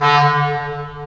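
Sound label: WND CL, wind clarinet; LA Composer vol. 3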